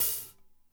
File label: OHH, HI-HATS; Spectrasonics; Liquid Grooves; LG HI-HATS